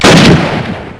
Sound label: M1 Grand Single Shot 4bit; BlackCow